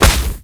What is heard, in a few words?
Fight Magic Ball Damage; Mighty Party; Android